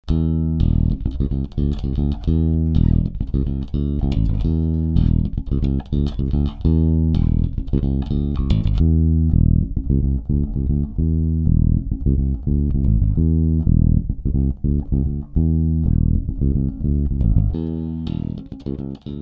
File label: 110bpm Fingerstyle 4 EQs